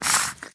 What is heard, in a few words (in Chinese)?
狐狸被激怒声